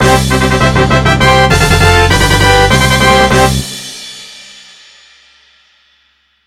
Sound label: Fanfare